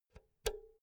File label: power cord pull out soft; objekte; elektronik